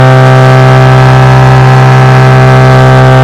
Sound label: wankel medium, engines; acf engines